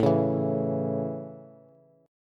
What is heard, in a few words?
A Major, Bass; strummed; JGuitar; x,12,11,9